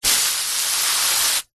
hose water spray heavy pressure onto grass single burst; household; zapsplat